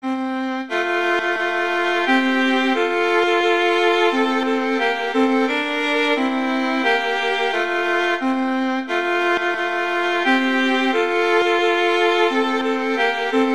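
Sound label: O Christmas Tree, Violin Duets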